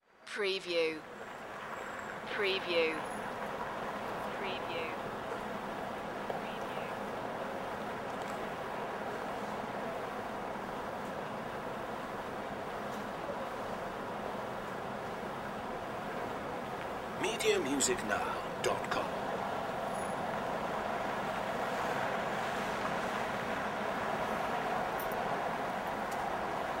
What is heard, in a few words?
City Ambience - light city traffic at intersection